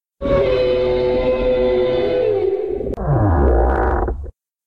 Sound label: Female Roar; MUTO